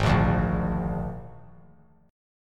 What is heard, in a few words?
Ab Suspended 2nd Flat 5th Ab, chord